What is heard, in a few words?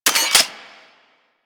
Heavy Shotgun Mech; Weapons; Warframe; Tenno